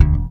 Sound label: bass 3 (D) (snap); Sonic Foundry (Sony Creative Software); Ugly Remnants VOL-2; Basstuff